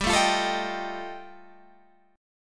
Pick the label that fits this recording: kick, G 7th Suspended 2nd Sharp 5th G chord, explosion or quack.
G 7th Suspended 2nd Sharp 5th G chord